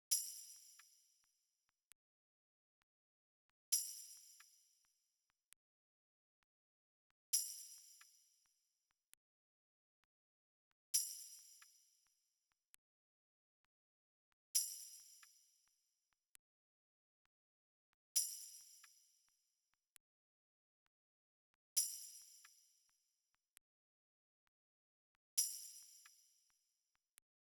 133 BPM G# Min Accent; World; Cymatics; Bazaar

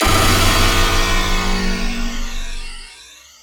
Blur Impact, Dubstep; One-Shots; Effects; Ghosthack; Dubstep Sounds 2025

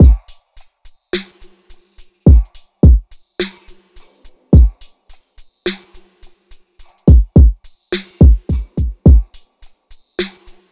Drum Loop 106 BPM; Love Language; Cymatics - Infinity Beta Pack 2.0; Infinity Drum Collection

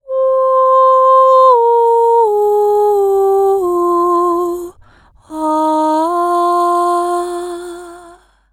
Texture Vocals Outro - Fm 80Bpm; Construction Kits; Ghosthack; SkyRealm; Kit 08 - Into The Light

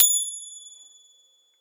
Perc; Ghosthack

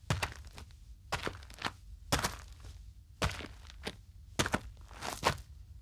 Boots On Rocky Dirt Footsteps; Fairlight Sound Lib

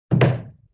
Wood Hit

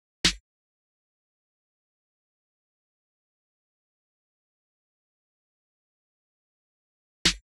Drum Loop - 137 BPM Snare; Loop Stems; Cymatics; Mystery Pack Vol 4; Cage Fight

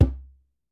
Djembe - C; percussion; Cymatics - Infinity Beta Pack 2.0; Zeus Percussion Collection

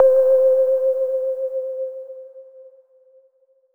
Pluck One Shot - C, Lofi; Wisdom

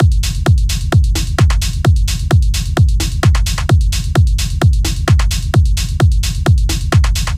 Techno 130bpm Full; EDM Drums; Drum Kits; Stems; Ghosthack; Drum Mega Bundle; Ultimate EDM Drums; Techno Kit 11

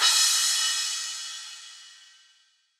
Crash; Cymatics; Trap Starter Pack; Cymbals; Weight

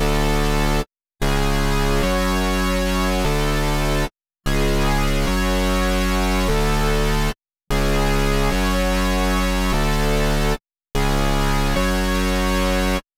148 BPM F# Min Bass Stem; Melody; Loop Stems; Cymatics; 2022 Melody Collection - Wavy; Man On Fire

